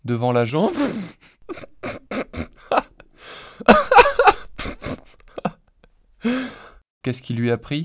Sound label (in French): rire synthese contextuelle